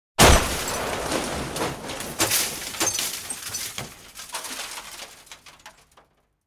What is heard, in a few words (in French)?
Choc vilolent